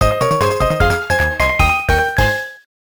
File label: Register phone; infinitefusion